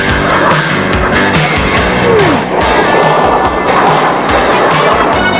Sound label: 2 JETS